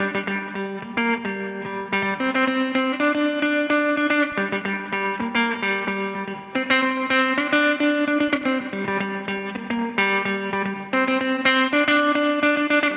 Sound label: 110 Bb Guitar